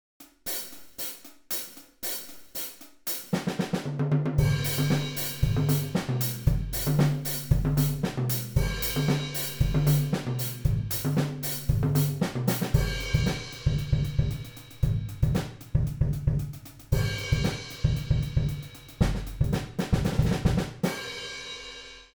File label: Groove, drum-sets